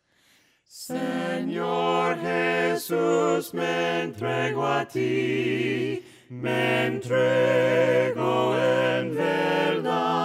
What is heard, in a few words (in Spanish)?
VOCES; ENVIAME SENOR